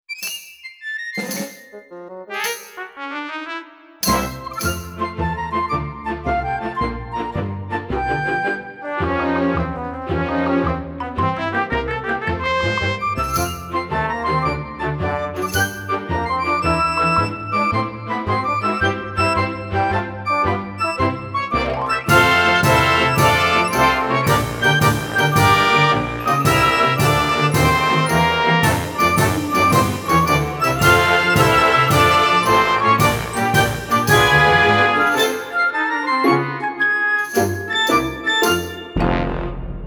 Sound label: Bouncing Ball Dance